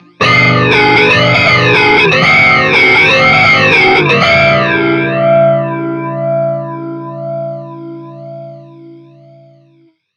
フェイザー

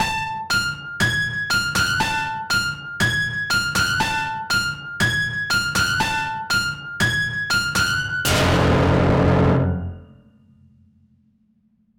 怖いジングル

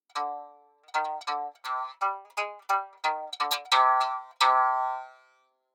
三味線

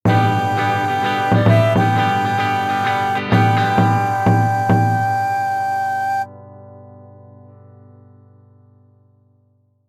和ジングル

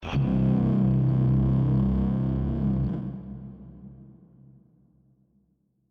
不気味な声

